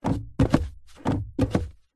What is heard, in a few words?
car gas pedal press down then release with foot engine off multiple time; vehicles; zapsplat